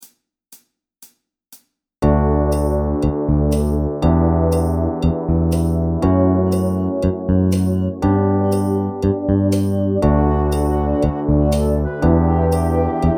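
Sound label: Drum Groove Fast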